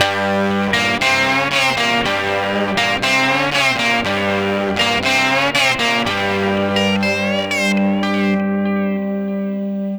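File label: Guitars 120 G; Zero-G Kocktail Kollection; Adrennalinn 2fers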